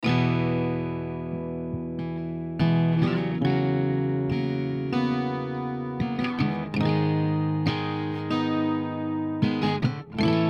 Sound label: E140 GTR Blue Sky Impro